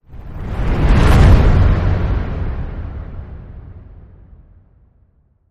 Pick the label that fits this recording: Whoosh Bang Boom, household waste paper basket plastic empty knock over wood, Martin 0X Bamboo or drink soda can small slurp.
Whoosh Bang Boom